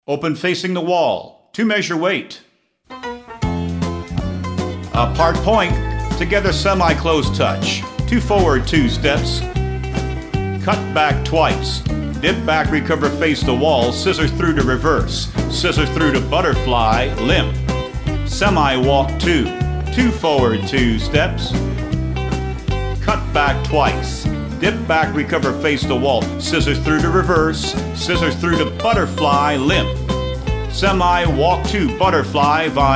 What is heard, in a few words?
vocal sample